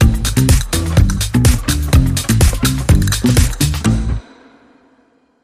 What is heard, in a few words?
Spanish Flair, Sting